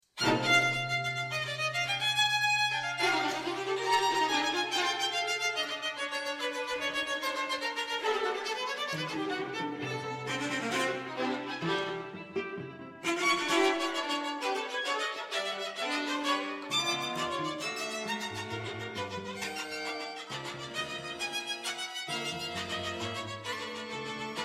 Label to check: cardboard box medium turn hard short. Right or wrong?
Wrong